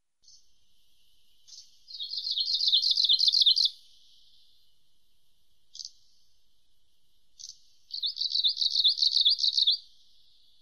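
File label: penochka talovka golos, Arctic warbler voice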